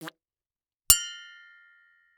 Human Male Spits Into Metal Bucket

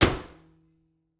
Auto Nissan Altima Hood Close; Transportation

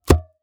Cartoon Balloon Release Rubber Snap

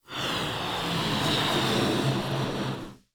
Cartoon Balloon Blow Up Long

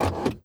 Auto Nissan Altima Door Unlock; Transportation

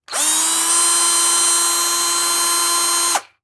Industry Tool Run Electric Drill

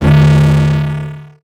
Transition Hit Impact Distorted; Production Element

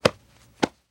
Hand Pat Back Multiple; Foley

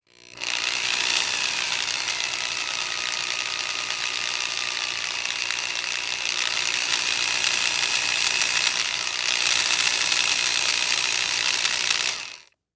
Industry Tool Drill Power Drill Slow Spinning

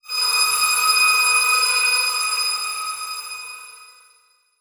Title Transition Feedback High Pitched; Production Element

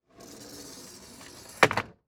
Door Close Screen Door Sliding; household

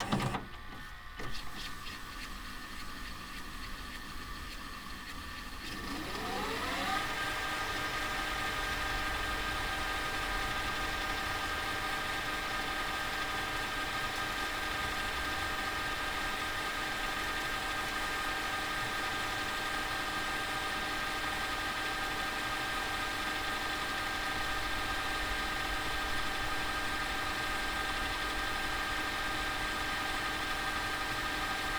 Technology Electronic VCR Fast Forward Tape

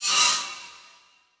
Title Transition Feedback Blast; Adobe; Production Element

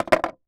Sports Board Skateboard Jump On Cement

